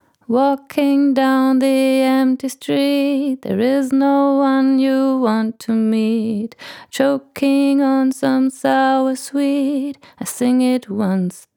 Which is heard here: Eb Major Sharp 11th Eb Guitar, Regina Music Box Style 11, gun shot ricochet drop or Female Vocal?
Female Vocal